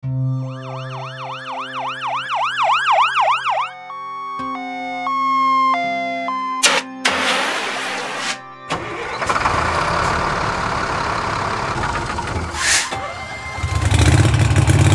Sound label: Emergency